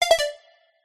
multimedia game sound synth digital three tone beep